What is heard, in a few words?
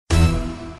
mystery; Criss Cross 81; wazdan